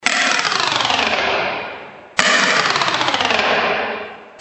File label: cutaway